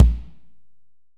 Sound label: AMB KICK; ST AMB KICK